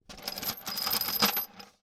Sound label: Metal Bolts; 99Sounds; The Warehouse